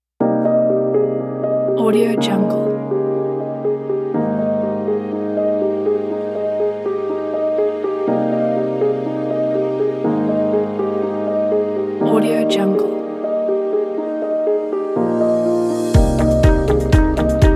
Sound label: successful startup